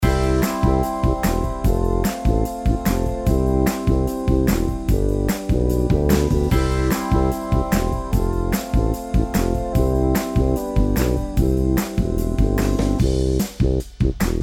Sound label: Cute 8-Bit Monsters